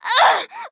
Scream, FVoice; rimworld animations

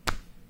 Clap; rimworld-animations

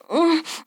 Moan Short, FVoice; rimworld animations

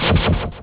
grow; notsanequarium

